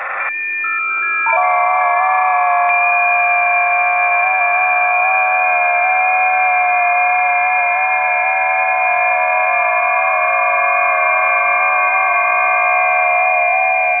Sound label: 20m busy, ft8